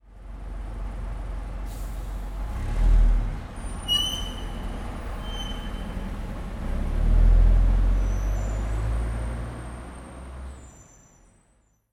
Bus Brakes Squeaking

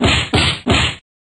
boxing punches

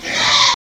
lamb

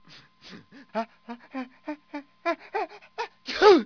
people

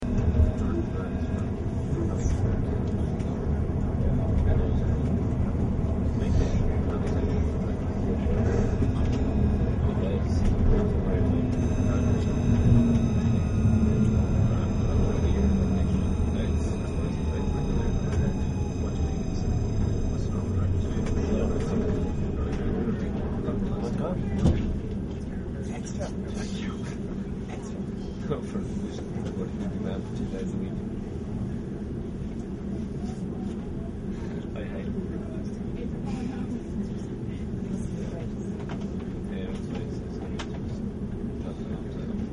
dublin

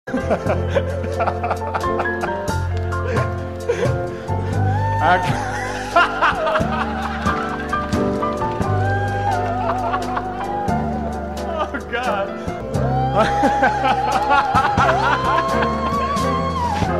ringtones laughing

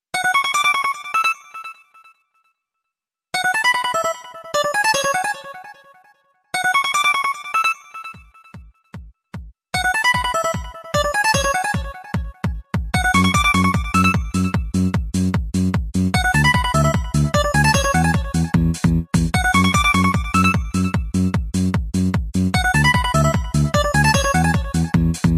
radiation, ringtones; alcatel

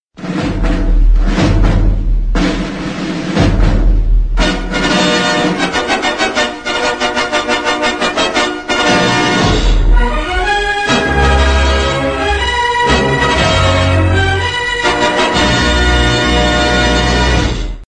movie start

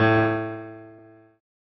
piano

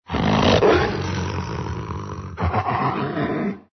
ANIMAL WEREWOLF

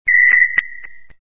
whistle

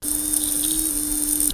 TOY ROBOT MOVE

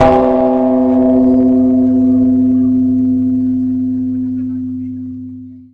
zen temple bell

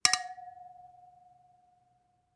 Wine Glass Clink

